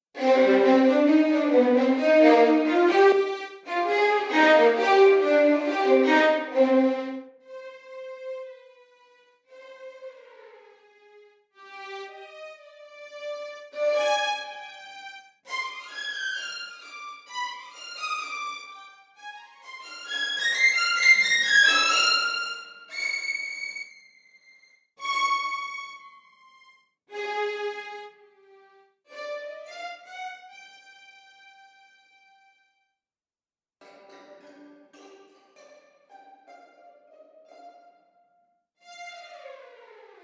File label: First Violins Test Room; LSS